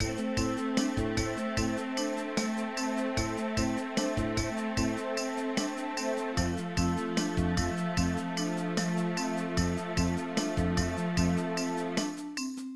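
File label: stem chromatic percussion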